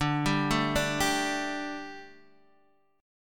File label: D Suspended 4th; x,5,5,2,3,3; arpeggiated